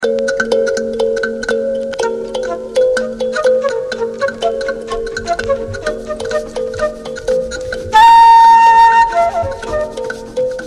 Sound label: strings